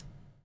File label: BUBL, Backbeat; Spectrasonics - Backbeat